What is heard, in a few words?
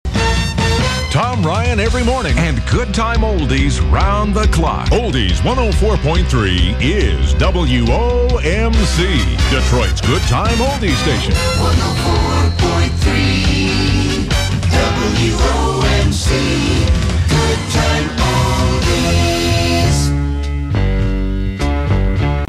Jingle; WOMC-FM; Tom Ryan Every Morning